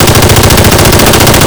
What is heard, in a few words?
Inf Weapons; Red Orchestra 41-45